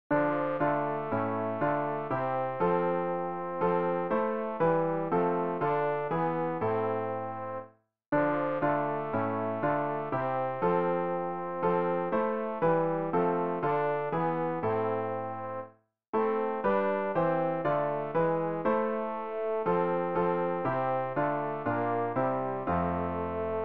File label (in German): tenor; Ref. Gesangbuch; RG 680: Befiehl du deine Wege